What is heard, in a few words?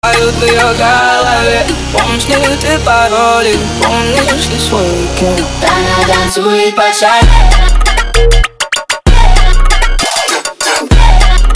Funk Remix